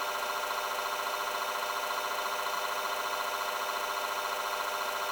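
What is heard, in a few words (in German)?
Sound inaquidistant 1500 rpm; maschinenakustik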